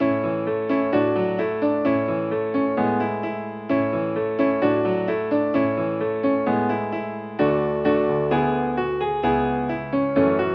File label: accomp; hymnary